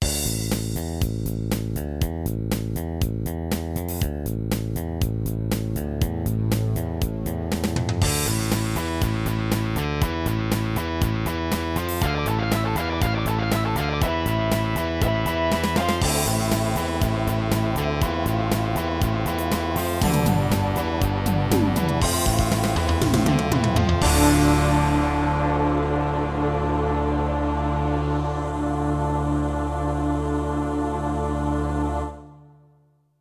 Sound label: SC-55 GM test; SC-55VA